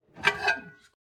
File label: Plates, Cooking; Nikko Barrera-Amaya; Cooking night